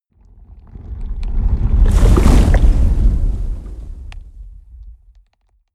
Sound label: whoosh fire lava wave large